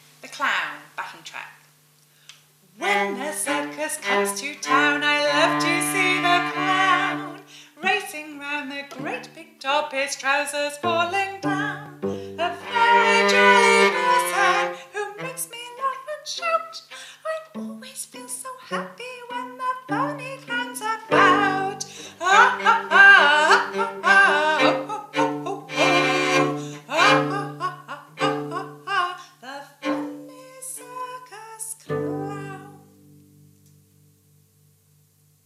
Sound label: vocal and backing